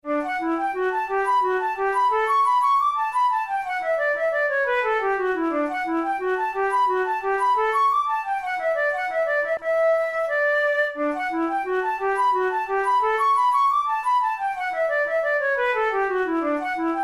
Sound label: flute studies in d major; dothel